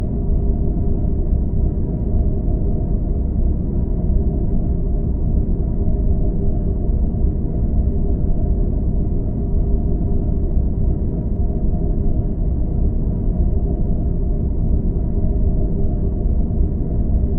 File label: Star Trek Deep Space Nine Ambient Sound